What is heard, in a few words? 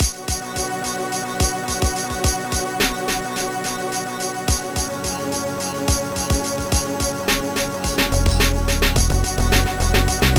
beatbox; Pinball Dreams